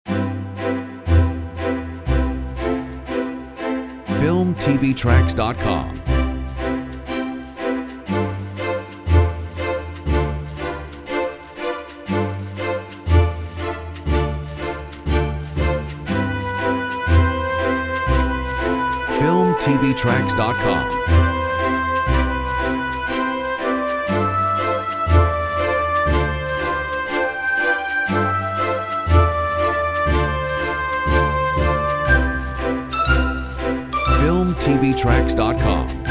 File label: Raindrops